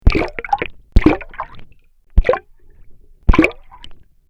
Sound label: Hydrophone Dipped In Water